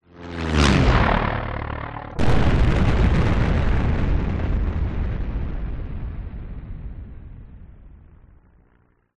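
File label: plane light prop crash explode exterior; vehicles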